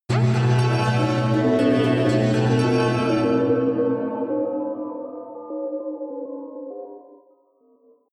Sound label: synth bells roll, drones